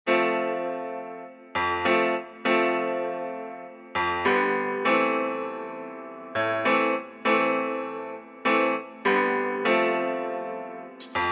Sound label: Godsend Rain instrumental